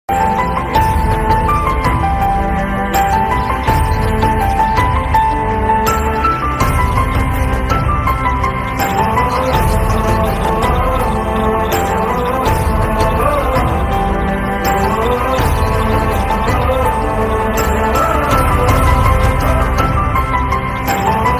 Bgm Instrumental Ringtone; Animal

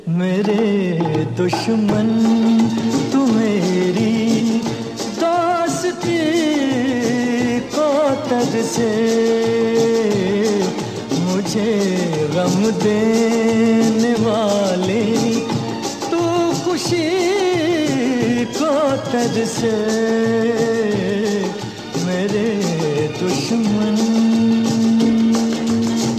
Old Hindi Song Ringtone

